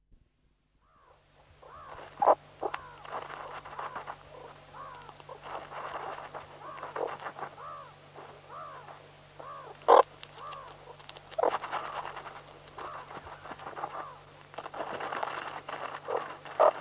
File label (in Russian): surikat golos